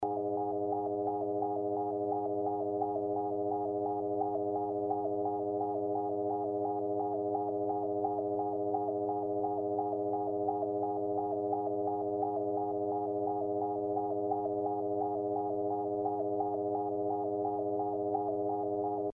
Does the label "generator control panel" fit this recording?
Yes